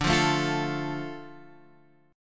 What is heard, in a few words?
D Minor D Guitar Standard; strummed; JGuitar